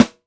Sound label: original snare one shot b key